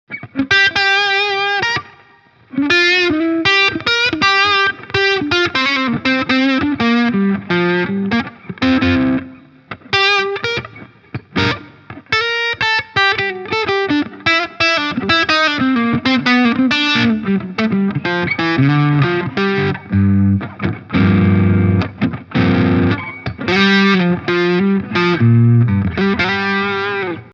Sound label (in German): PRS DGT Hals verzerrt; PRS David Grissom DGT